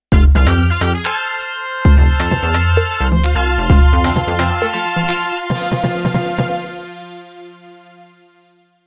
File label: GAME MATCH WIN; Party Crashers